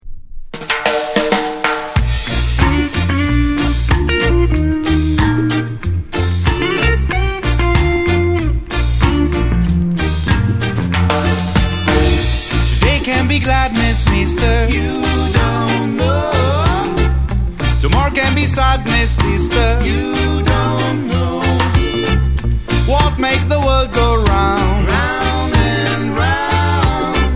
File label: ding